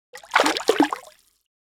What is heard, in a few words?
Bathtub splashing water movement